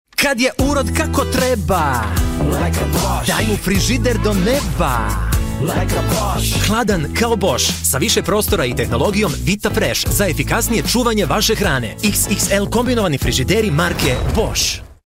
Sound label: Commercial sing, bosnian